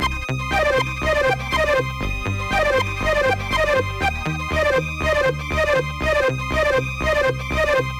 Aerobics; Dreamer Series Top Model; nds

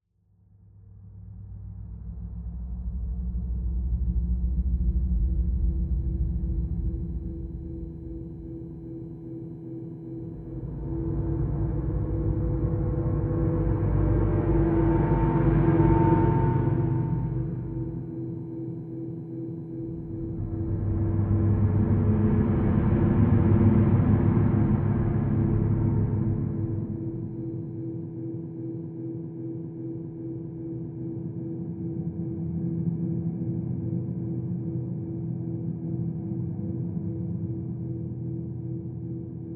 Subway Gas Mask Explore Mood

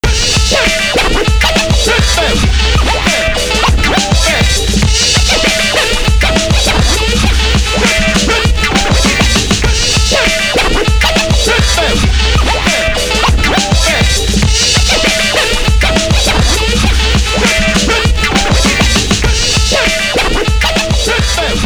Continue?; Street Fighter III 3rd Strike; Dreamcast

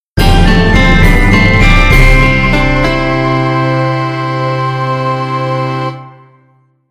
Boss Success; super wiloo demake; ps vita ps4 switch windows xbox one gamerip 2019

